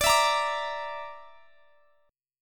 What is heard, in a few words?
D Suspended 2nd Sharp 5th D, guitar; strummed